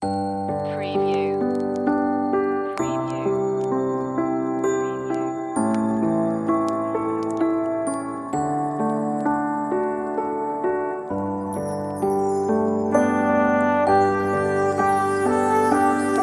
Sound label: We Wish You a Merry Christmas Relaxing, on-hold music